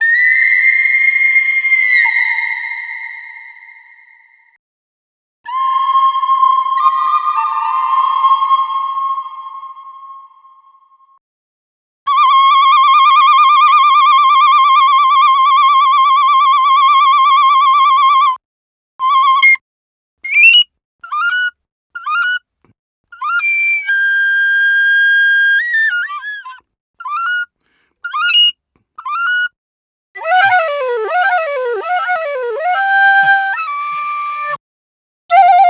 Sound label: Penny Whistle, African; Quazibeat; African Secret